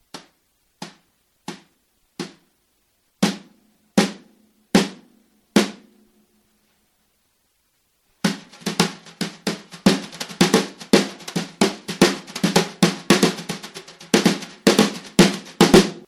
brushes tap

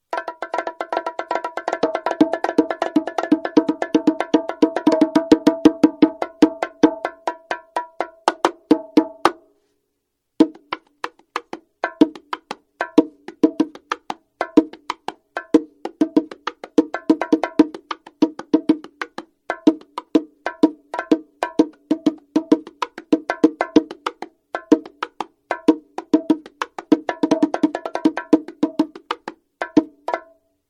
bongo, NOMAL PATTERN; LP793X; GALAXY GIOVANNI

